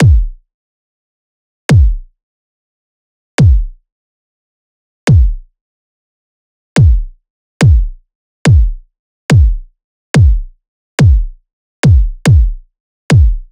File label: loop kick 142; Seismic Loops